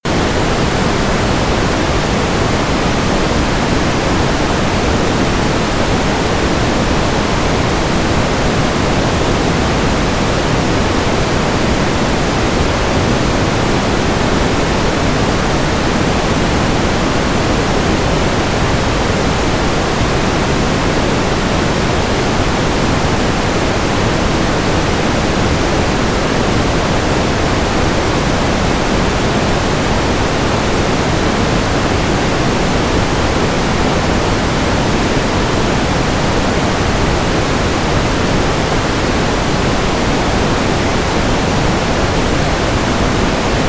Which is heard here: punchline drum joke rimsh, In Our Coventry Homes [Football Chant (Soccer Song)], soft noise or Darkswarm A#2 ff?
soft noise